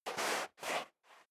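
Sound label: step dress boots sand scuff, footsteps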